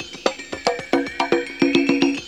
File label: JAZLOOP; SoundFactory; DRUMLOOP